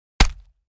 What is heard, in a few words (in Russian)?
udar